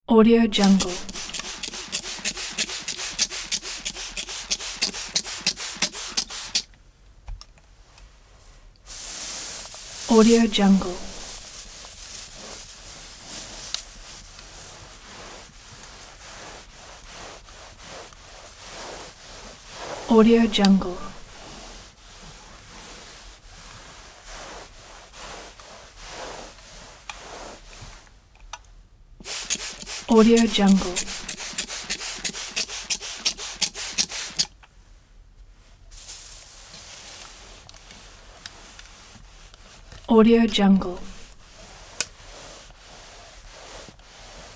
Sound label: steam iron and ironing with spray